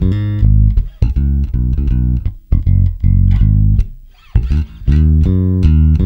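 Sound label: shuffle G#, bass; Spectrasonics; Bass Legends; SHUFFIT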